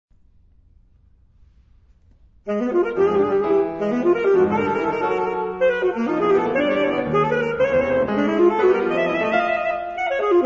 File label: saxsonata